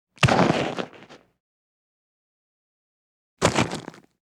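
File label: body falls on gravel; Parkour; Butterfinger Video Sound Effects; Additional Parkour Sounds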